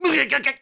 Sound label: Hurt Electricity; Link hurt by electricity; Skyward Sword